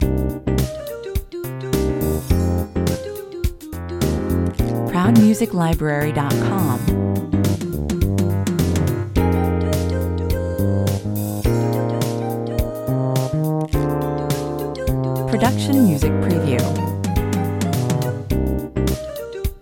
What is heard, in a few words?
jazz pizazz (ending) 105 bpm